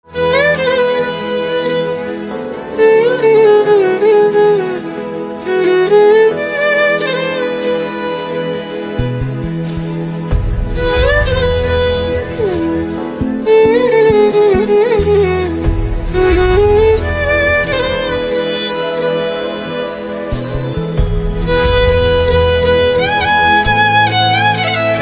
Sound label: Violin